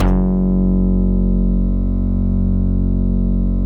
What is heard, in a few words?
SMACK F#2; Masterbits - Tekkno Therapy